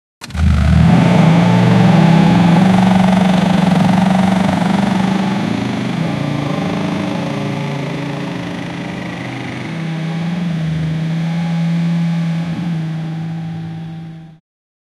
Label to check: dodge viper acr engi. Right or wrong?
Right